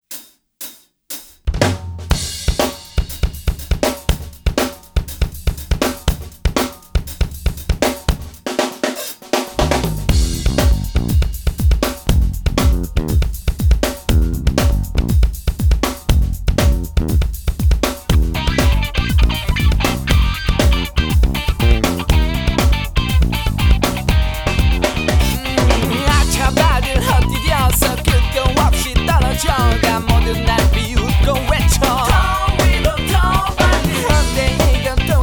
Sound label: (Intro)